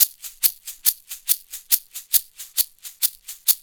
Roots Reggae; Sounds Good - Roots Reggae

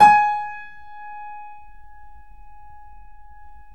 KEY, Steinway ff; Roland L-CD701

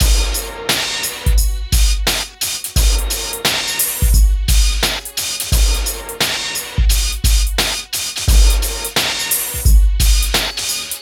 Hip Hop and R&B Drumloops, mix

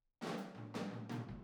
Boogaloo Intro Room ST, Drums, 82 BPM

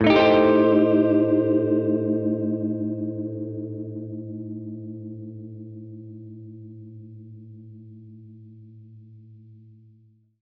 Gretsch Chord A7b5, Guitar Chords

